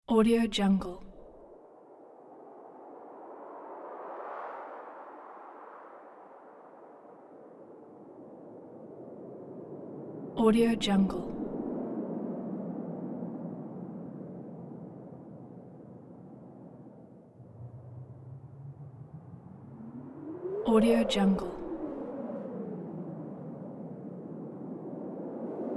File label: wind sound